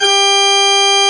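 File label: Church Organ C6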